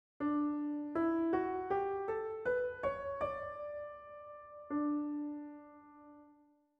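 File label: D major scale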